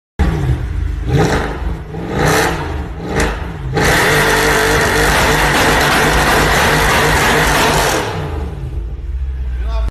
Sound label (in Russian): zvuk vyhlopa C63